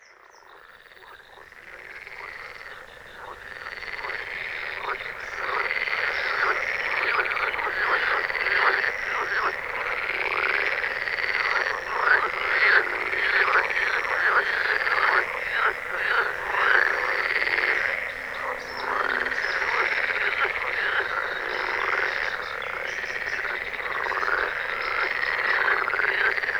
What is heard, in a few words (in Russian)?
lyagushachii kontsert v polnoch solist bolotnaya kamishovka